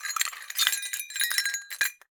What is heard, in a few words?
Cowbell Chain, Percussion